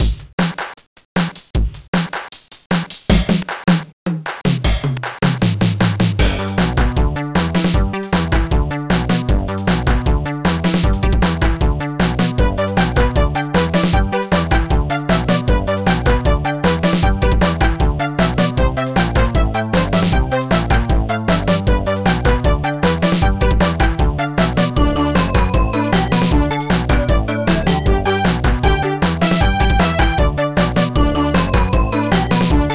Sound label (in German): musik